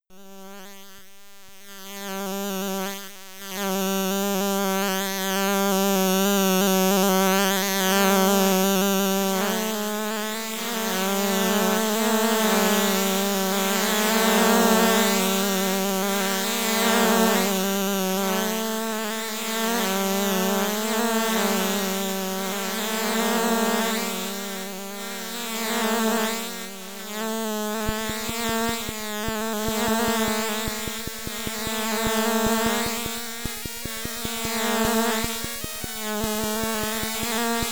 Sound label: Title Screen (SID Stereo); Insects in Space; Commodore 64